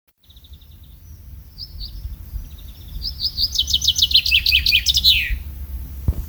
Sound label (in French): Pinson Des Arbres Chant